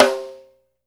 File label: HIT, SNARES